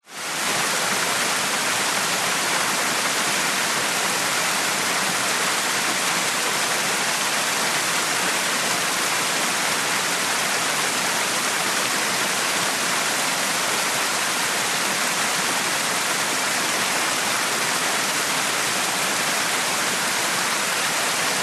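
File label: Mountain Stream Rush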